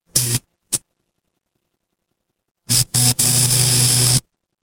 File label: fire electric zap